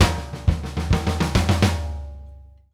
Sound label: BUILD UP, drum fills; Sampleheads - New York City Drumworks VOL-1